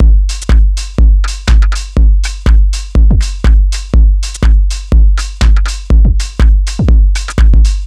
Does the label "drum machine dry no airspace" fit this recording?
Yes